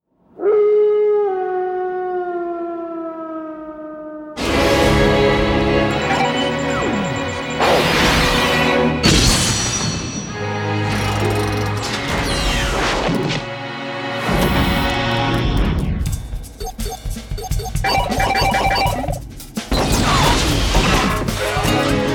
Percussion Ver.; Wolf's Rain

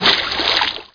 JUMP OUT WATER

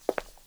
Tile Foot Step

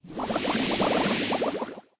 Bubble

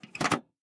motherboard Slot

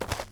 Cardboard Foot Step

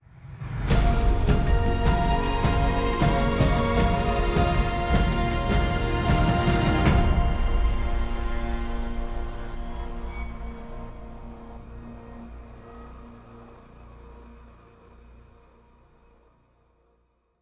Victory; apex legends season 12; Seer

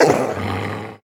Gameplay Wolf Growl; Zombie Catchers

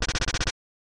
Combo Hit

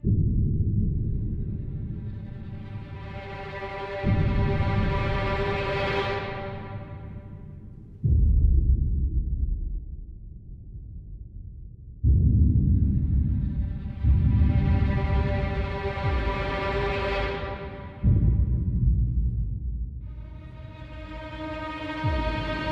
Layer Mystery Loop; SpaceCowboys; stardrift nomads linux macos windows gamerip 2017

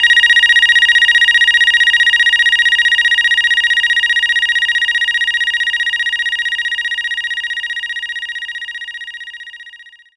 Telephone

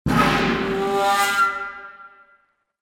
Clock Destruction

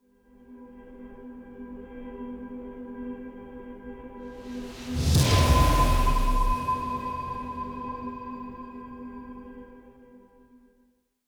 Mask Shatter Cutscene

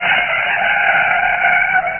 Skid; Buggy; PS1

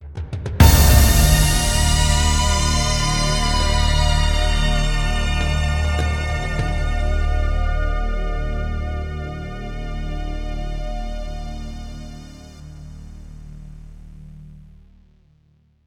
Music One Shot; gamerip; Zombie Army Trilogy; PS4

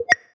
Accept Call; Welcome to the Game II

